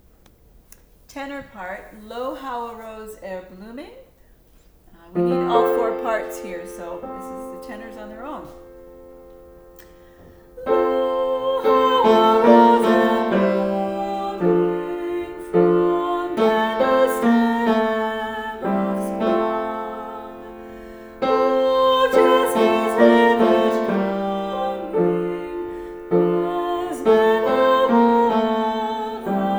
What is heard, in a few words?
Tenor; Lo How a Rose